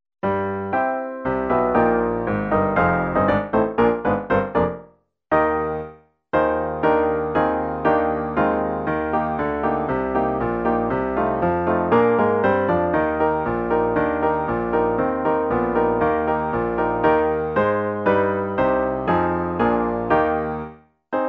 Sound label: Accomp; An Army Medley